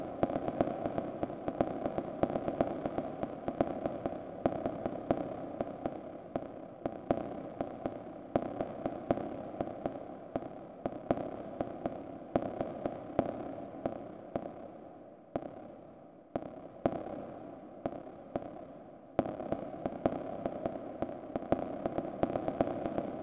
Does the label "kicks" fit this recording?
Yes